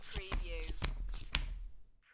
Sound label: Playing Cards Dealing Three Cards Flop